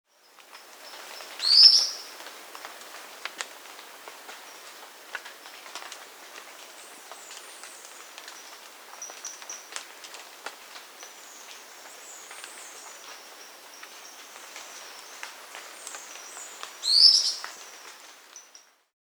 Fulvous-Breasted Flatbill (Rhynchocyclus Fulvipectus), Andean Birds; S. America